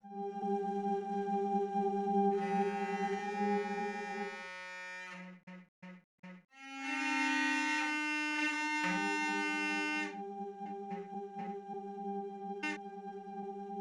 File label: oboes